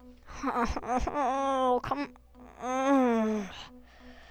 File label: groan